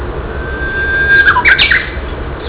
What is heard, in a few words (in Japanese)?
uguisu; KitamotoShizenKouen